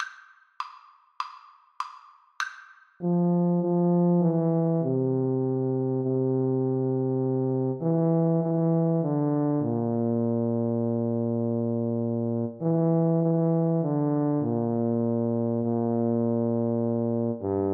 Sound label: Tuba Duet